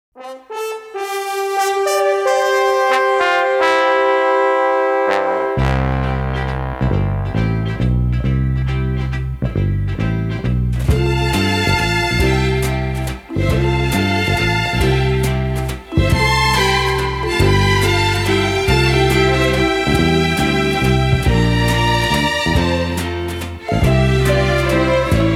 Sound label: Soft Strings